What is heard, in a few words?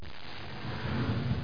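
door close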